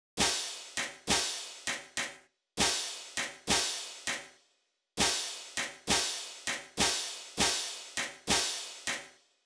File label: Cymbal Plus